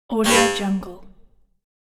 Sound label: sf automaton robot